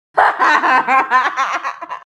laugh girl

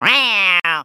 other cat thing

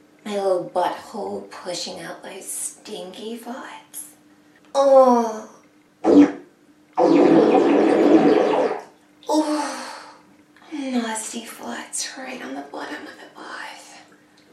bath farts

